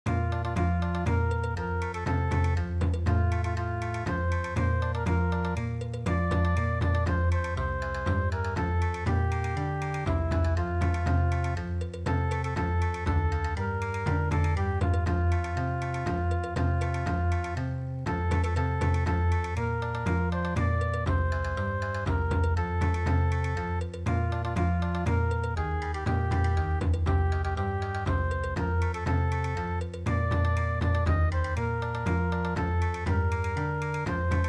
Bon Odori, midi; Schweizerpsalm

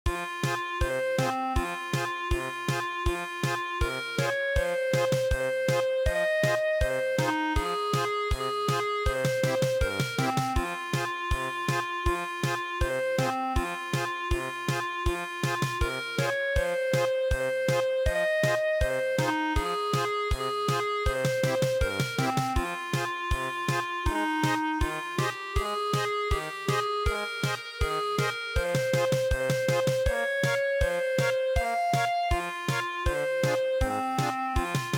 lullaby German Polka; traditional

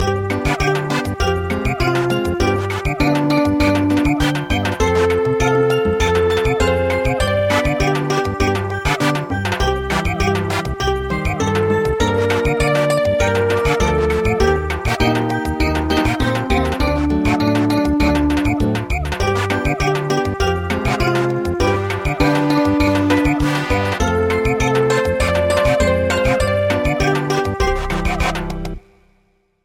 Samba, traditional; The bales are fun

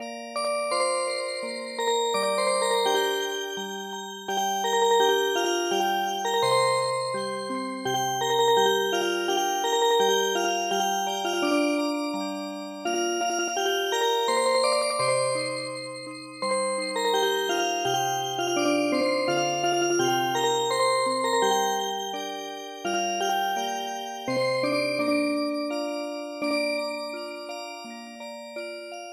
Music Box

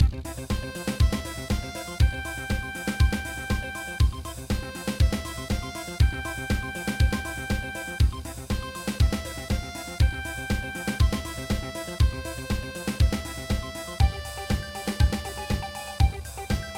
trance; Saints

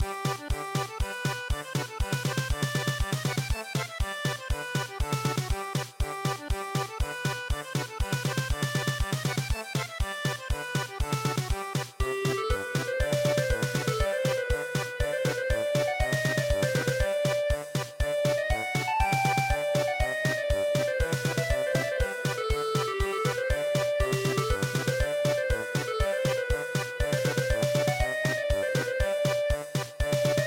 turkish war march German Polka

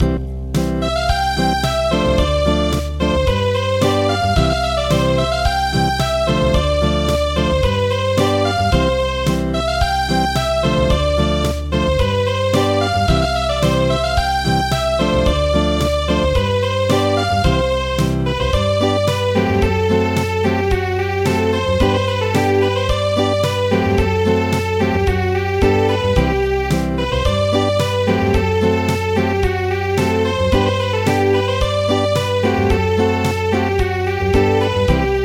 Acoustic, traditional; El Xarranco

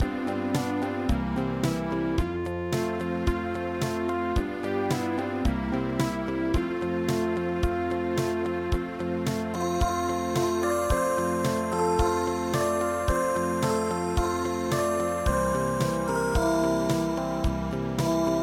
Ballade

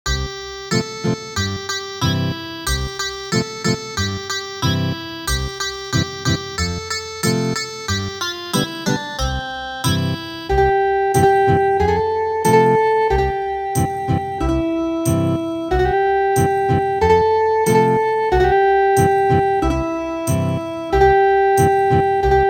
whirling Ethnic Guitar

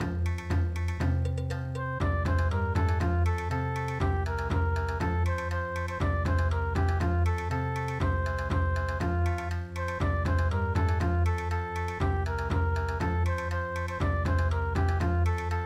Bon Odori

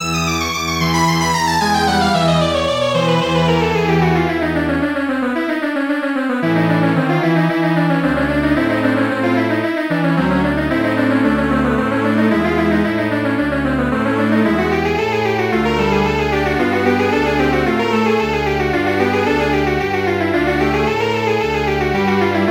Strings Quartet

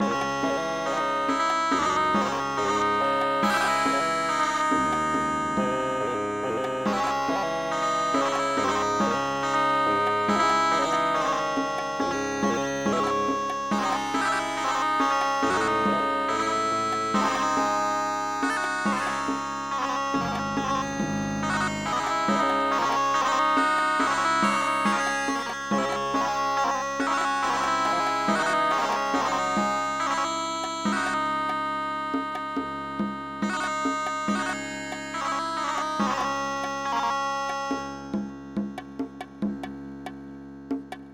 Indian Traditional, midi

